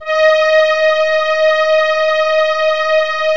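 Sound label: M1 STRING D#1; Northstar - New Gold VOL-1; M1+D50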